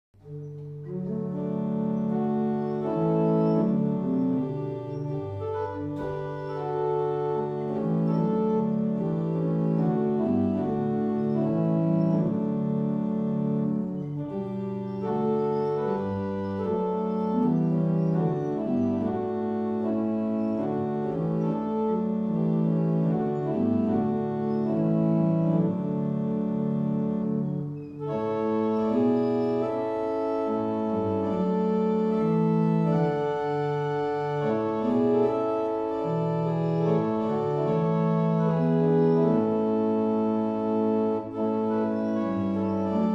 Easter Hymn, barrel organs; Fobbing; The organ is operated from the rear. The softwood Gothic case has three flats of gold pain

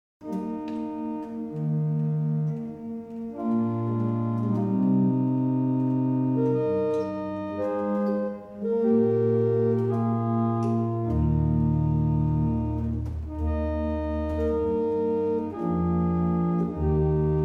Manchester, barrel organs; Witton

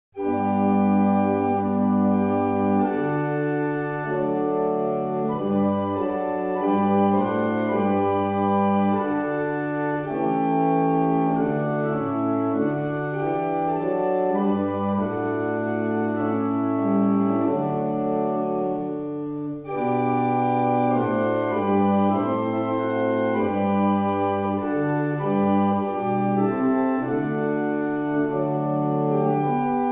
barrel organs; Stratfield; Portugal New

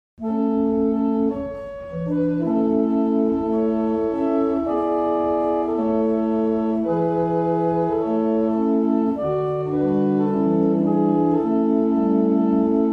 Abingdon, barrel organs; Cardeston

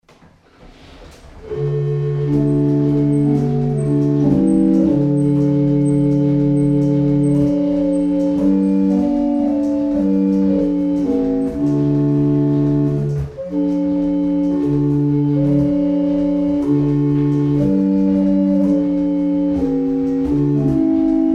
barrel organs; Boxford; 91st Psalm

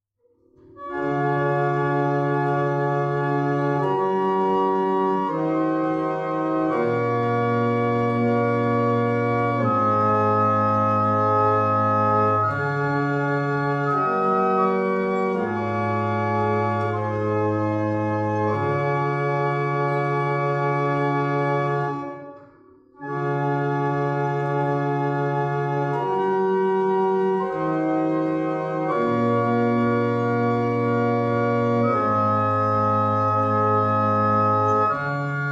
Single chant, barrel organs

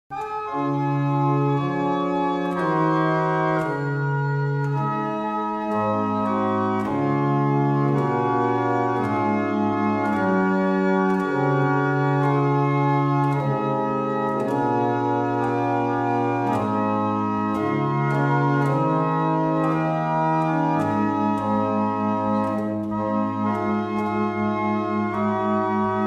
barrel organs; Tytherley; Harington

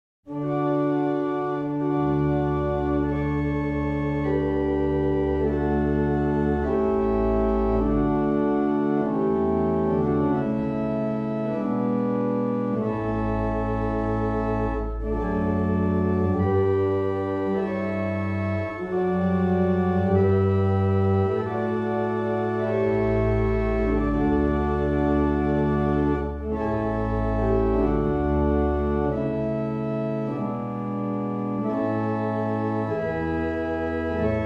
Colchester, barrel organs; Meldreth

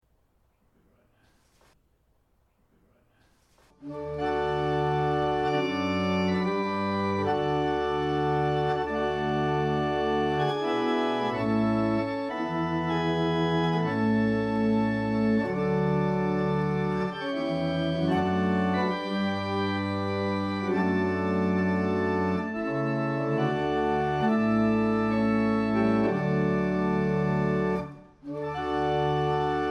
Double Chant, barrel organs; Quantoxhead

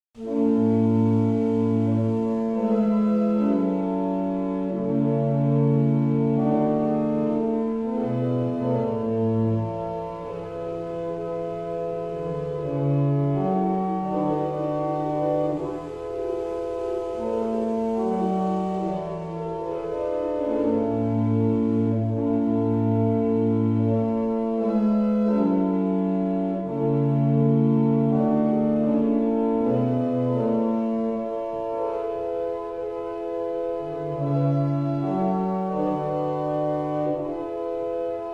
Double chant, barrel organ; Avington; Norris

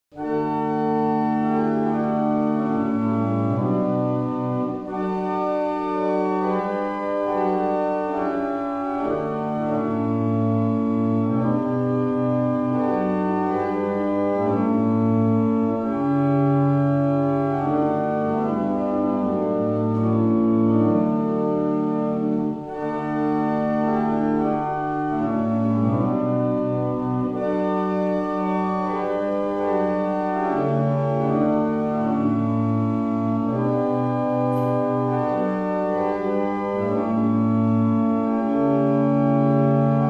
Double chant, barrel organ; Avington; Mornington

